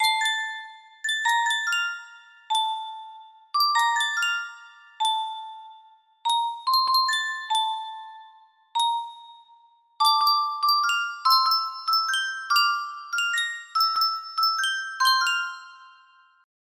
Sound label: Sankyo custom tune music box